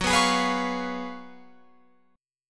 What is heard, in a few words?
Gb Major 7th Suspended 2nd Suspended 4th, Guitar Open F